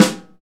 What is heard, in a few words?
SNR